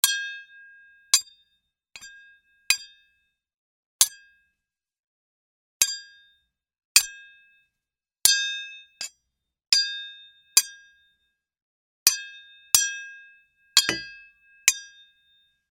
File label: sword